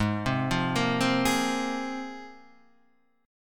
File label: Ab 6th Add 9th Ab, Guitar, Open D